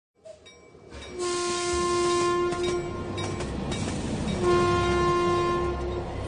Yeni Gelin SMS

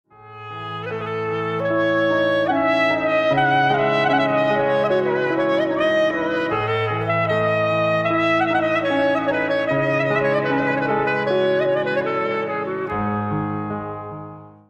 Klarnet

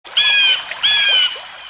mesaj sesi

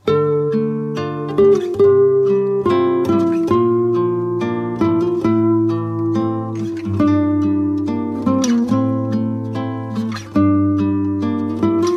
Duygusal fon

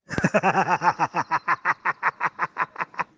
gulme

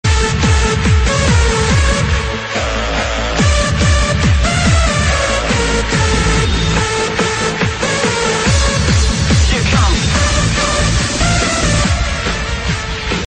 Trance oyun havasi

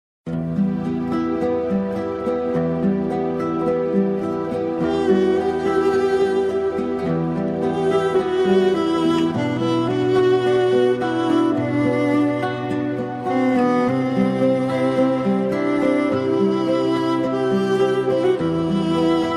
Duygusal fon

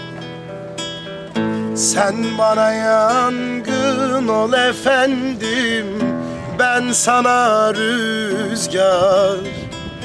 Akustik

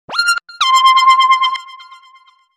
Bildirim Sesi

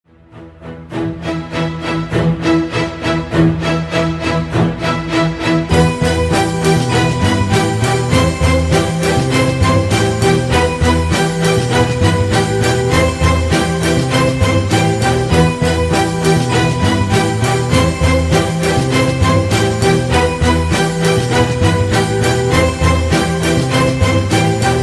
Dizi Musik; Icerde

